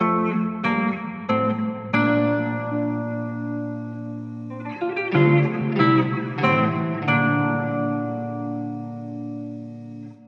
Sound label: BPM 93